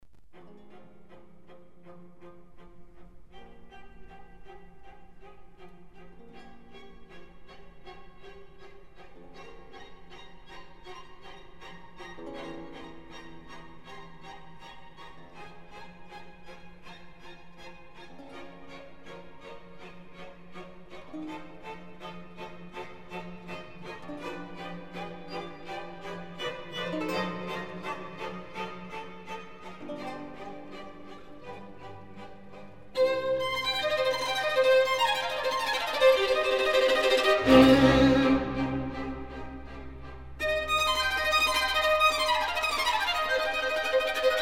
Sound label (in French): hiver